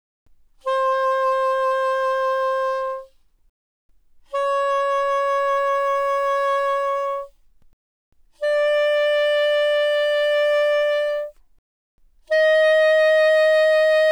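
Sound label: Soprano Sax Vibrato mf C5 B5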